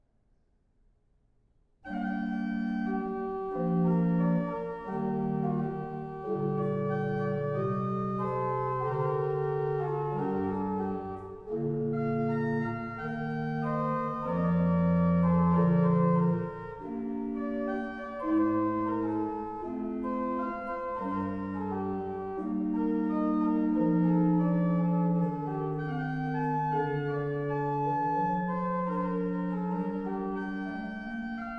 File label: Organ Concerto Op.7 No.1 Organo ad libitum (Adagio); Handel